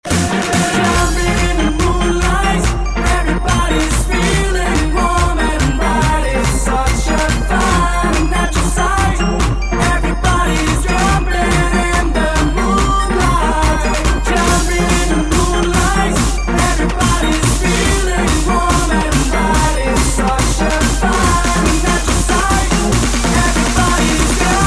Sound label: Jumpstyle